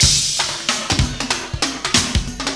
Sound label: PERCS, 70 s Breakbeats